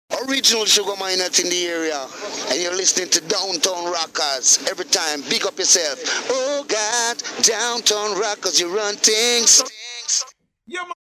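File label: Jingle, reggae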